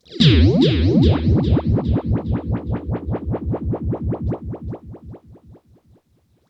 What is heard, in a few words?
RIPPLES R, Funk Guitar; 90 s Sample CDs; Zero G